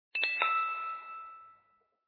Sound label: ring; butterfly island adventure